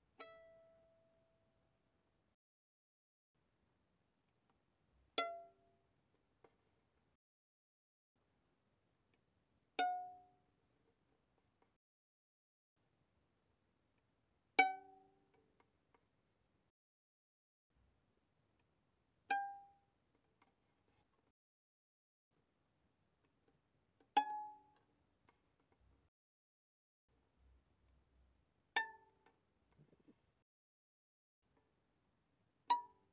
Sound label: Violin pizz mf sul E E5 B5; Strings; violin2012